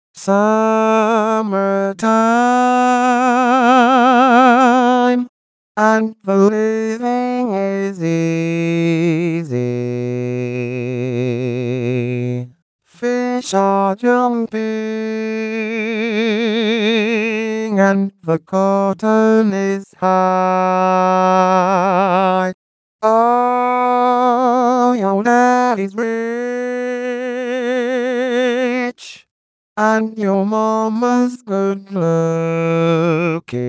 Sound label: Main Tune (voice only), vocaloid